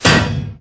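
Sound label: attack hit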